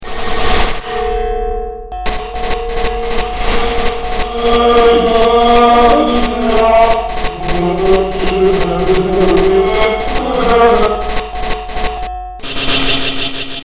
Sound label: interlude